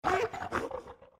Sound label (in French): Cri; cris EV